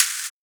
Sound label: hat; Anthemic